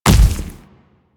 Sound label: Splitter Step Default, Footsteps; Warframe; Infested; Grey Strain